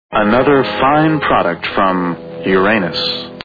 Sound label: fart sounds